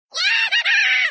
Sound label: Ya-hahaa!; Jawa